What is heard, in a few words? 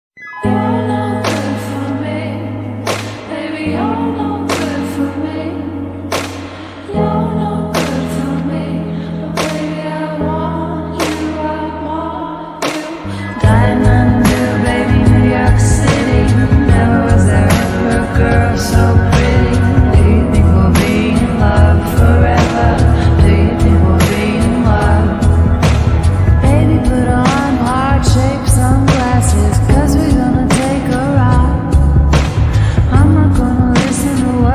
slowed and reverb